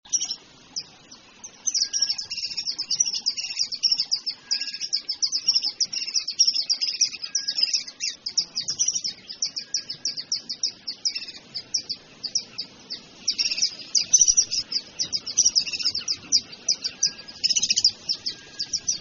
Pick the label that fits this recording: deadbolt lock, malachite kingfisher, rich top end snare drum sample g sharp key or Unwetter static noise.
malachite kingfisher